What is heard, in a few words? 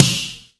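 snare drum; Zero-G; Chemical Beats; SNARE ZOO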